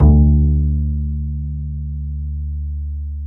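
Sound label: String, contrabass pizzicato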